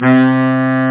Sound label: synth